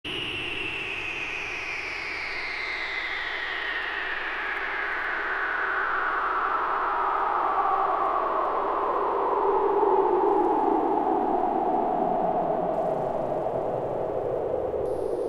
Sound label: Noise Electronic Music